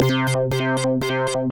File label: Electro Sequence, synth rhythmic; Instruments; Wavetable; ElectroFest Sequence; Ableton; Factory Packs; Synth Essentials